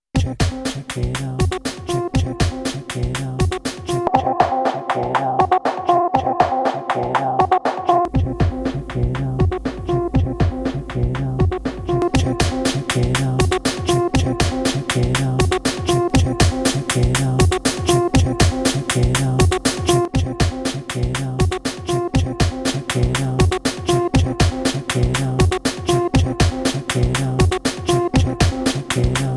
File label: Different Master FXs Vinyl Cassette Multiband Compressor EQ Limiter Maximizer